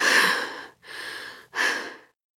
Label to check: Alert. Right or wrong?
Wrong